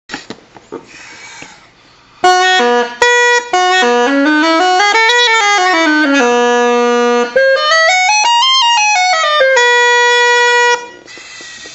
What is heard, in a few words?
scale, uilleann